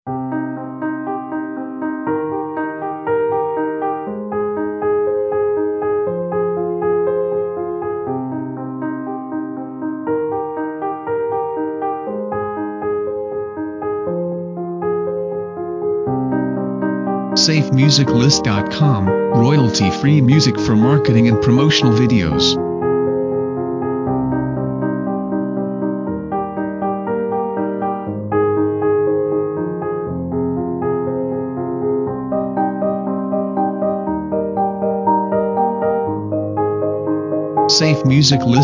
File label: Sad Piano Solo